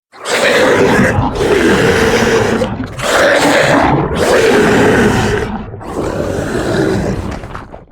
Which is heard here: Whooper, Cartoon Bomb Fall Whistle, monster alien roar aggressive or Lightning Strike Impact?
monster alien roar aggressive